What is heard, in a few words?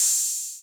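Open Hi-Hat 808 Color B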